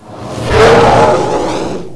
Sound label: FLYBY; Duke Nukem 3D Kill-A-Ton Collection